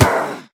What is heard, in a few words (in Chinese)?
女巫死亡声